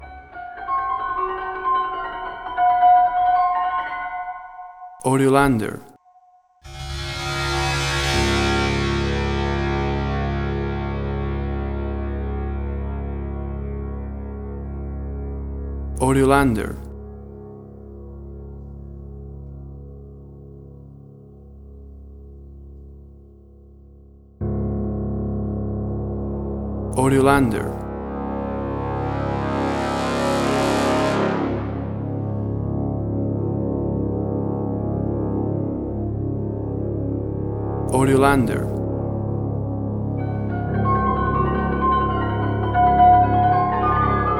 Filthy Filters

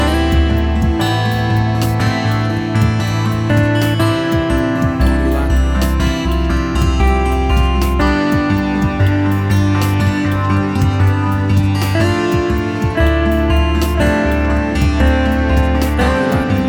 Acoustic Mystery

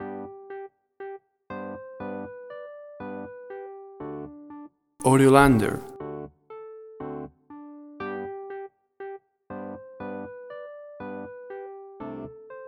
Wurlitzer

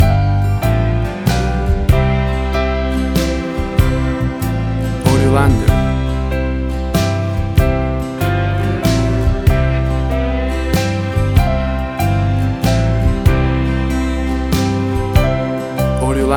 Warm Romantic Modern Guitar Ballad

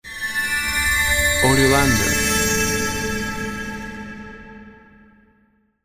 Tunnel

